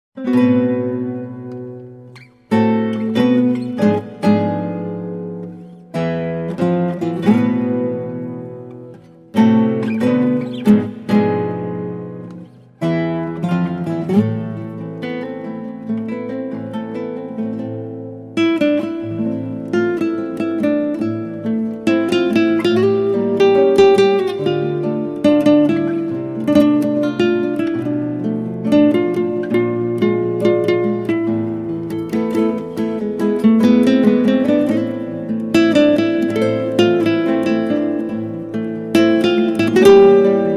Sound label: Guitar